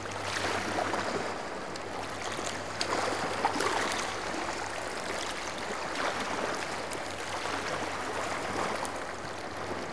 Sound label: amb pool indoor; skillrun3